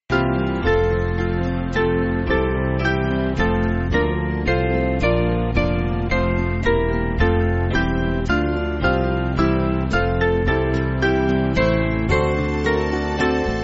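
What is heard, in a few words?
Band, Music Backing Files for Churches; Lord Christ True Peace; Westminster New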